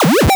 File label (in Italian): suoneria botton; beep